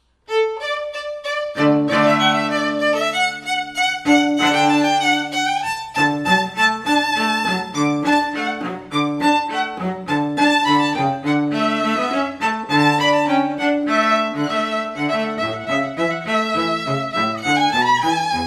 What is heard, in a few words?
duo, string quartet; Handel; La Bella Musica